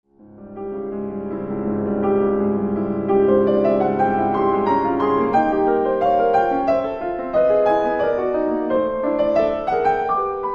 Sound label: Preludes for piano Moderato semplice